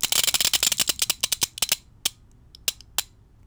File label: Ratchet Harsh; Smol Mechanisms